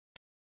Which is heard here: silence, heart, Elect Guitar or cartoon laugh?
silence